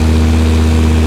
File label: Urus Low